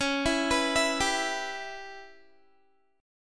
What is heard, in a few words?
Db Minor 7th Flat 5th Db down Guitar; arpeggiated